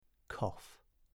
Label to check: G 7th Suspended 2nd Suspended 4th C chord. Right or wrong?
Wrong